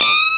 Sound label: WAIL